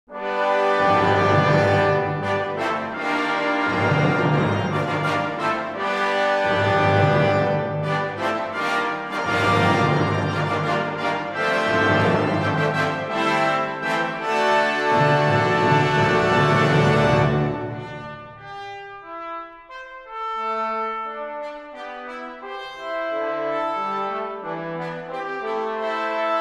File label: Fanfare